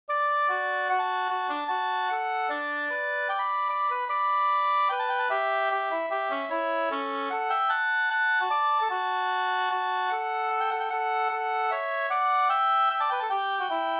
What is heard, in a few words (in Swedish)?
vals; Osteraker